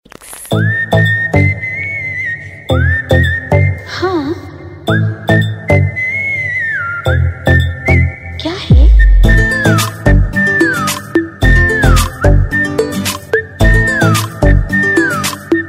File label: LOVE WHISTLE FLUTE RINGTONE